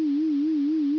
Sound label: Lens; SketchBook 2.1